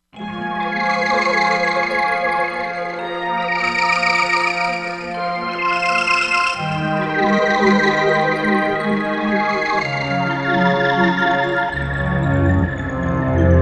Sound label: Specter STR, Korg MSC-16S; Environment